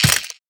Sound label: weapon c4 impact; physics; weapons